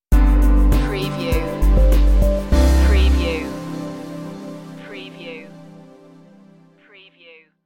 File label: ocean breeze stinger